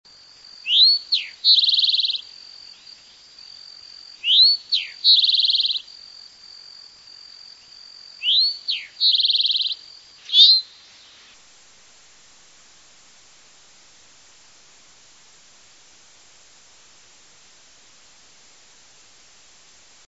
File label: Eastern Towhee